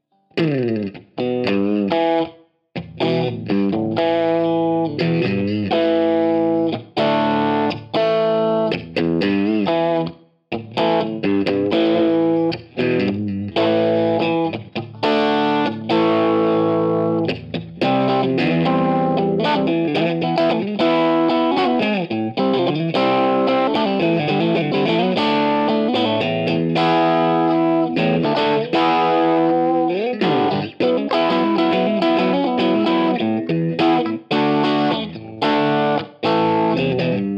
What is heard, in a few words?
Dirty Middle Bridge - Marshall Plexi, pickups